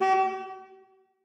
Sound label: boar idle; smite; god artemis